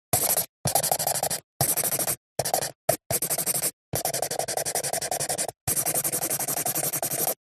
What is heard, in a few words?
Drawing Felt Pen Broad Point